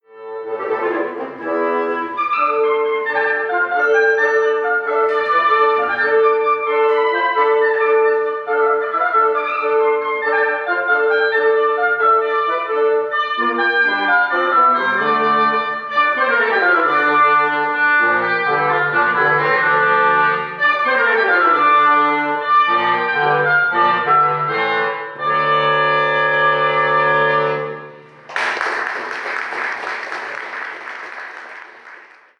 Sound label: tambourin; tomasi; champetre; dechove trio hibernal